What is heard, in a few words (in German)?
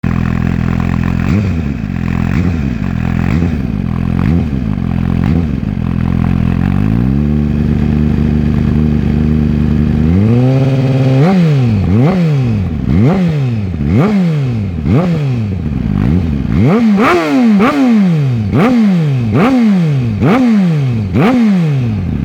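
Soundfile GSX-R1000 V4-M, Auspuffsystem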